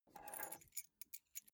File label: key ring small grab table soft